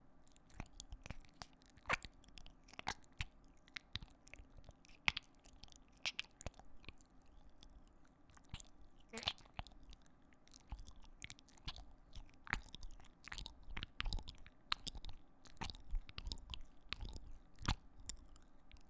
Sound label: Squishy Wet Sound Of Goo